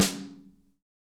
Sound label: SN ambient; Real Mega Drums